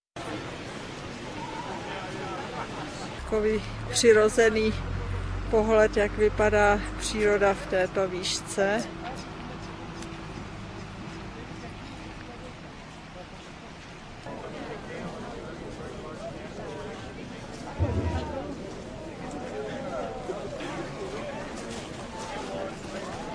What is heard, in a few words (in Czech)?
Papallacta priroda; Ekvador